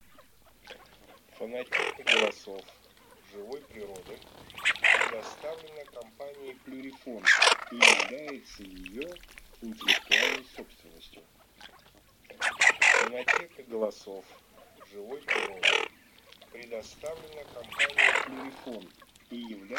Хохлатая чернеть Moretta

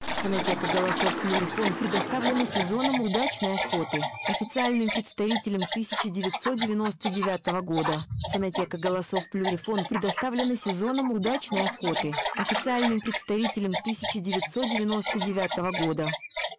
Широконоска

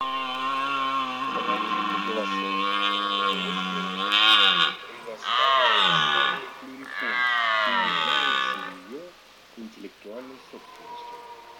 Олень Cervo europeo; plurifon

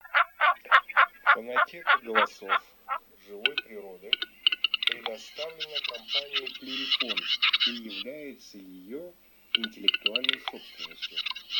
Глухарь Gallo Cedrone

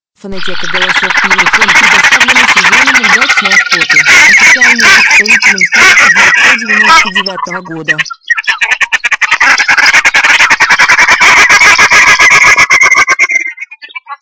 Пеганка

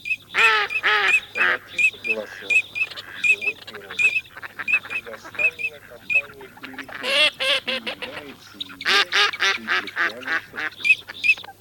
Кряква Germano + Чирок-свистунок Alzavola; plurifon

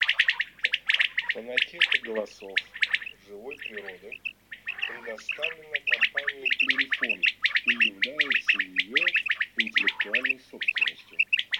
Перепел Quaglia; plurifon

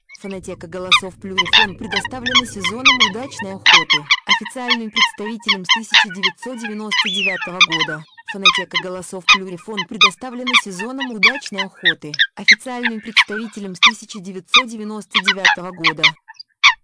лыхуса; plurifon

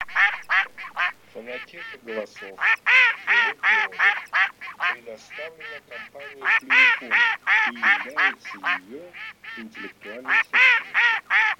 Кряква Germano; plurifon

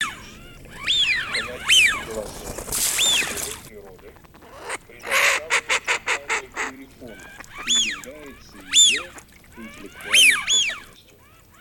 Свиязь Fischione; plurifon

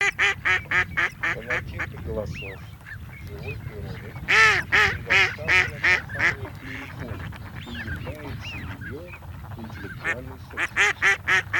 Кряква Germano

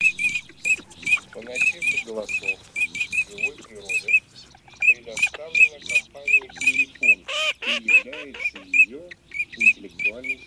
Чирок-свистунок Alzavola

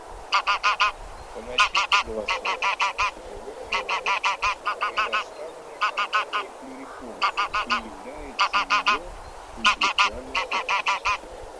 Гусь серый Oca selvatica; plurifon

